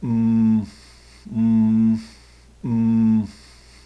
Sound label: mmm